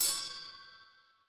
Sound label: instrument bell